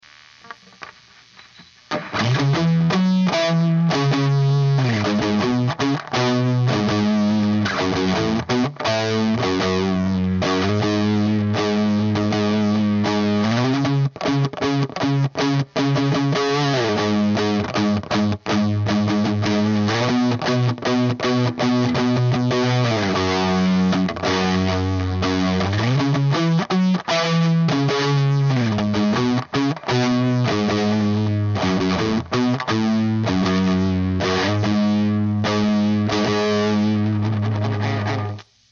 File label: Fizz